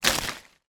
foley bush leaves thick hard quick movement impact; zapsplat